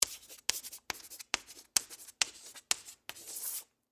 Office, Chalkboard, Write, With, Chalk, Short, Wheels, Classroom; Writing